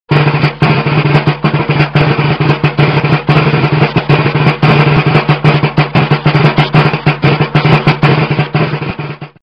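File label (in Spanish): redoblante; tradiberia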